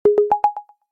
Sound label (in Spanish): bounce notificacion oppo